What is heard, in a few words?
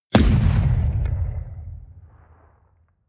artillery light distant